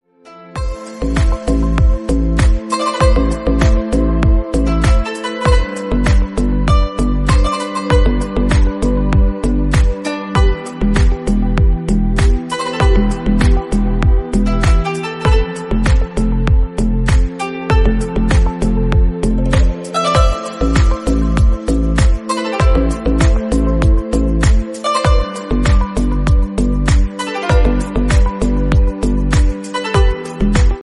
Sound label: instrumental bgm ringtone